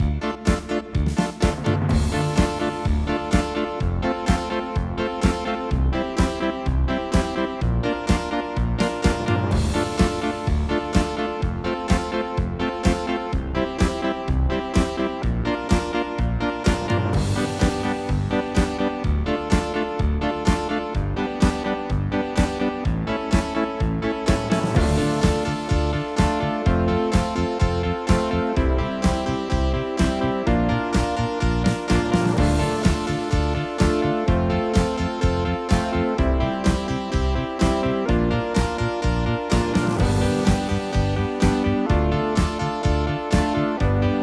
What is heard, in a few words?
Looping, chiptunes